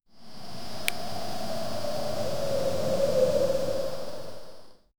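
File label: a deep contemplative exhale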